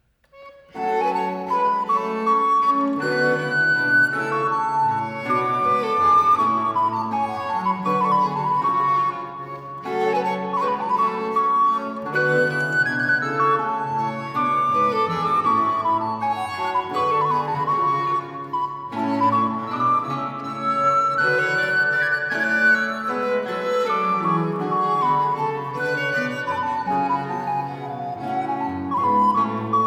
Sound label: Tempo di Minuetto; Ranunculus